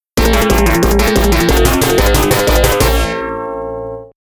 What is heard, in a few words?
Game is Over; toaplan arcade sound digital collection vol.03